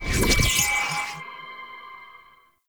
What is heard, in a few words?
Mirror Portal Beacon Stinger; Warframe; Duviri Murmur